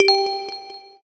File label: menu back; Nino